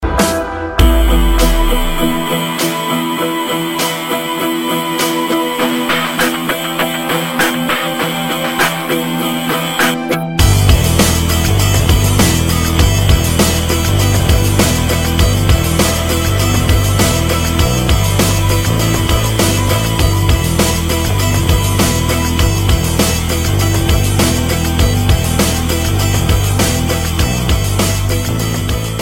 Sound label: new bass beat; dance